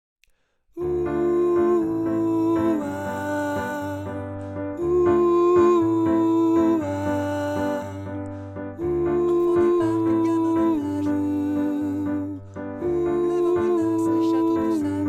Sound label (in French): alto